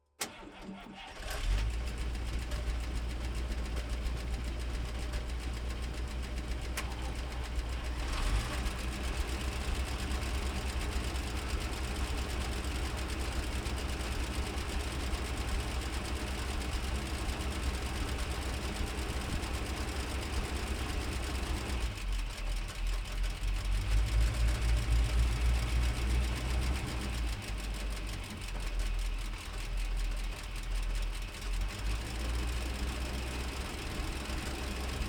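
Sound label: chaffee m24 onboard start idle blips steady in neutral off engine, Light Tank; DPA4061; Pole Position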